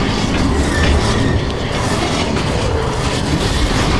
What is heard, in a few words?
Friction Metal; Flatout 4 Total Insanity